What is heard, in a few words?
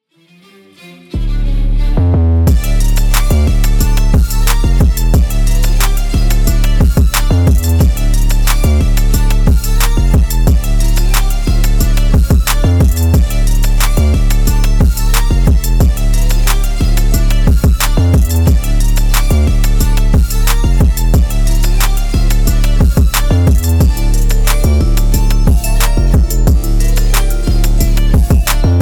indian music indian beat